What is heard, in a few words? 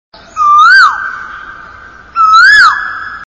koyal voice; animals